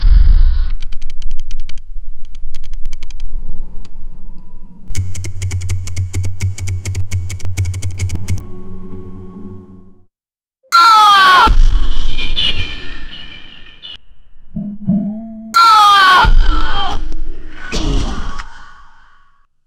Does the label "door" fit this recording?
Yes